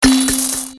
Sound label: bonus chance; Magic Forest